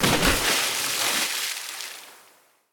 splash big

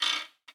clock hand

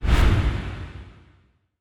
sceneswitch

